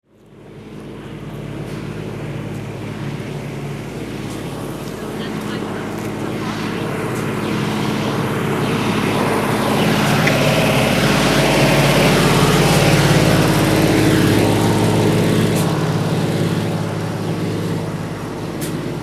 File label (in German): Flugzeug Propeller